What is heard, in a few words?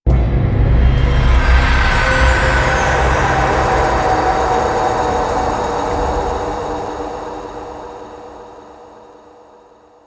UI Stinger Complete; Starcraft 2 Wings of Liberty